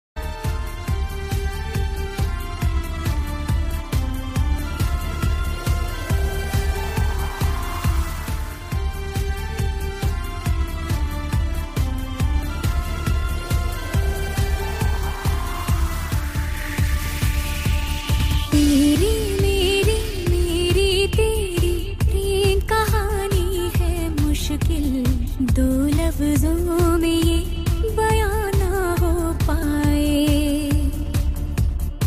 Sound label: Female Ringtone